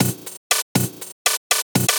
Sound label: drum loops; bb drum loops